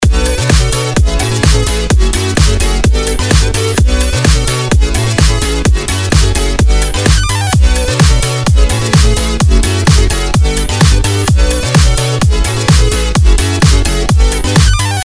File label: kick; whatever vrombler NEW kick